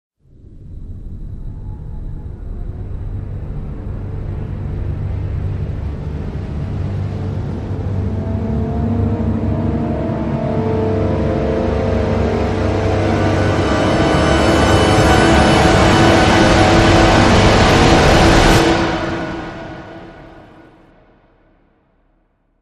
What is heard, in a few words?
Rise Bullet Time